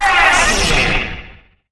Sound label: Guard Pain